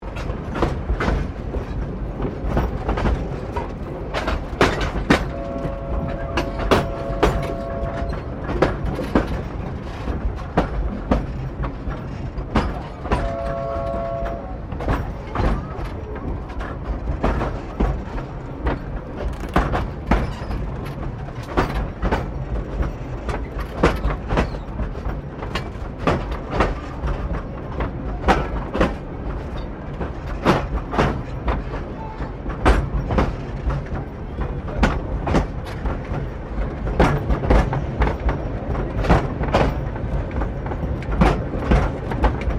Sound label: Train Carriages 1937 Exterior Rolling Slow Loop; Bellarine Tourist railway vehicles; Trains